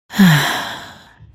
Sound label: stabbed; chief maid